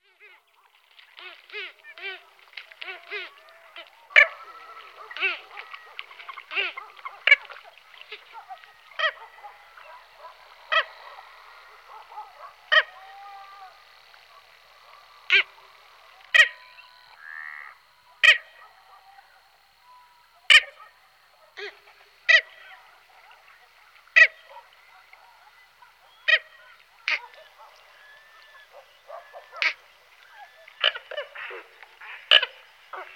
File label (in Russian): f. cristata, golosa ralliformes